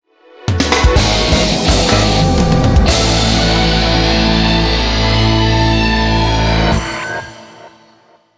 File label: Game Over; Under Defeat HD; PS3